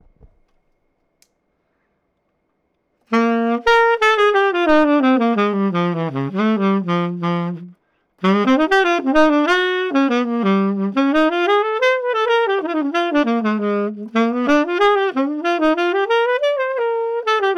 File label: Woodwinds Soundclip; Lupifaro